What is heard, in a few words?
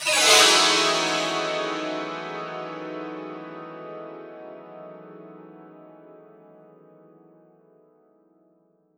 santoor fx; World Instruments 2; STRINGED INSTRUMENTS; PS SANTOOR SABERI